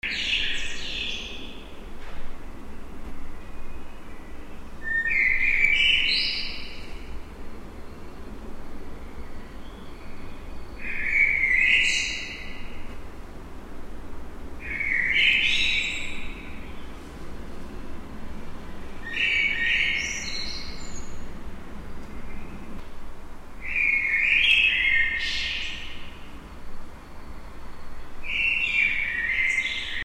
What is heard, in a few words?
blackbird in courtyard; germany deutschland